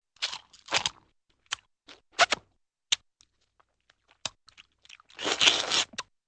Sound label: wet chewing with slurp